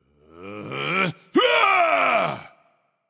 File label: battlecry; smite; sobek